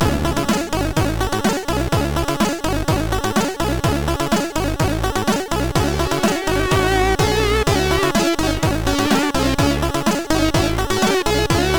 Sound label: Nephritis, MOS6581R4; hvsc